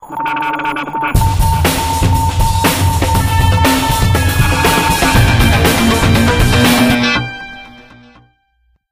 music; left4dead; Source engine

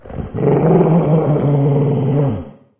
dog growl behind wall; ambient; animal; Source Engine; cstrike

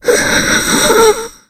death, infected

